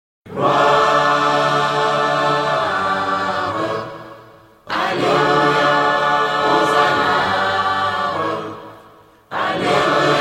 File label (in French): Reprise choeur